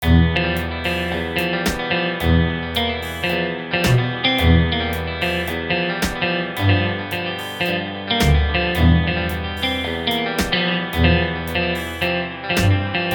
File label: blues